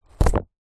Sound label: food carrot slice knife chopping board noise; zapsplat